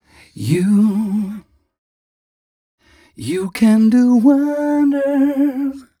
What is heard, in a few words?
m 129 mel e, Vocals